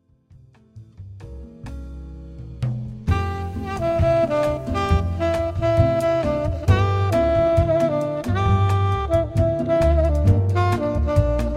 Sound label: Solo Sax